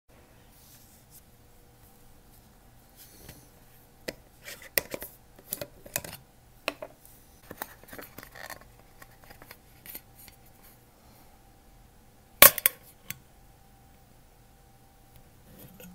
canned, باز کردن کنسرو

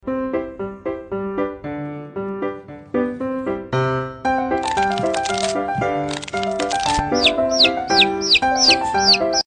Chicken; Insta Challenge; چالش اینستا

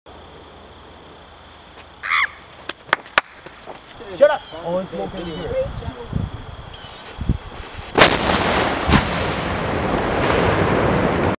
Carbide; کاربیت

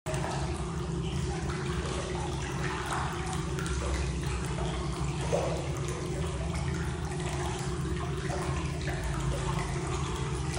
Jacuzzi water; آب جکوزی

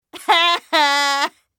to be ridiculed

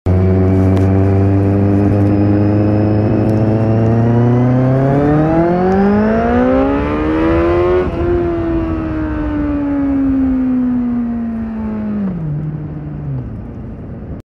Acceleration

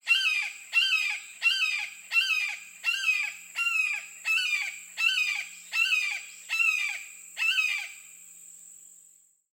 Hawk Sound

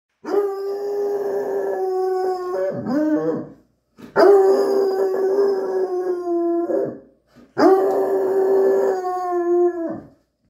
Estonian Hound; تازی استونیایی

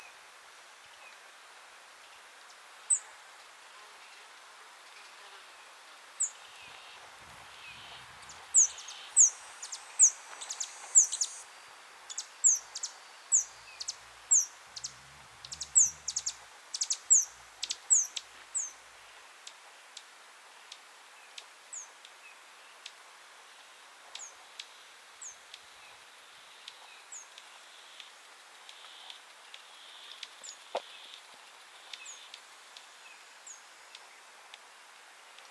Horned Sungem, پرنده

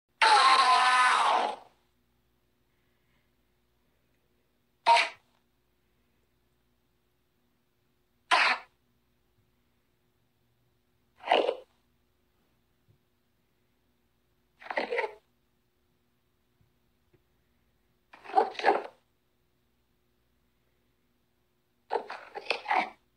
Endermen; ماینکرافت

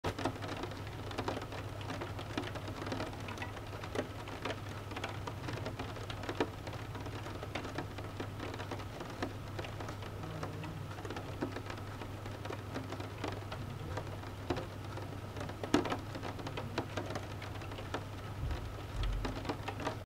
Rain inside car

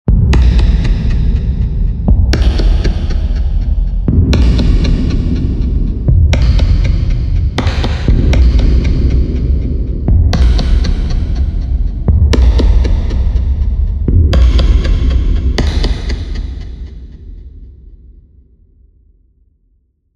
motion beat

